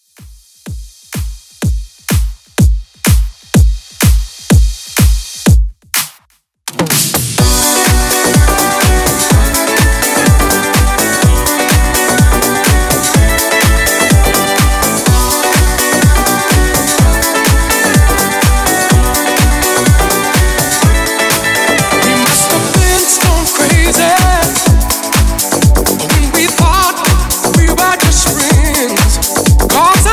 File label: ReDrum, 125 Bpm; 90s